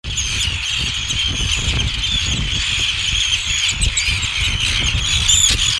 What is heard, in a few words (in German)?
Küstenseeschwalbe; Ruf